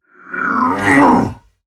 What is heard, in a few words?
Attack Effort; Warframe; Dax Heavy; Battle Barks